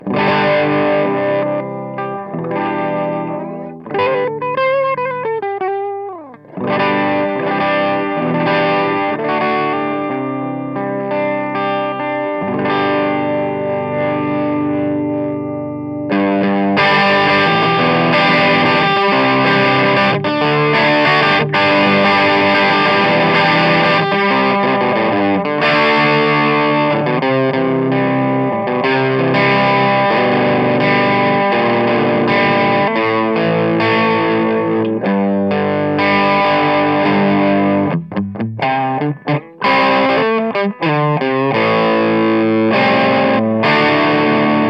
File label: 18watt pp